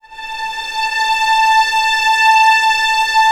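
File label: STR A4 PP, Orchestra Strings; Sound On Sound - Peter Siedleczek's Orchestra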